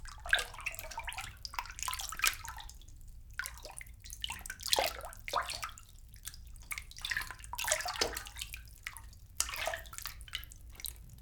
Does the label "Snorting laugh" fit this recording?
No